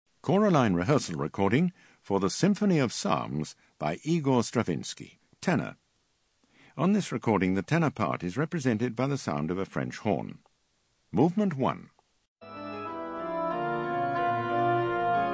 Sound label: Tenor; Stravinsky Symph of Psalms